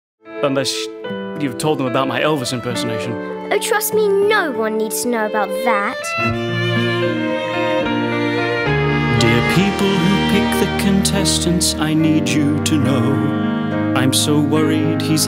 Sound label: vocal